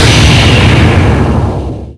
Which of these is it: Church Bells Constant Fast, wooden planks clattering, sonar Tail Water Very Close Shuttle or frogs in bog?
sonar Tail Water Very Close Shuttle